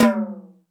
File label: More Toms, E-MU Pro-Cussion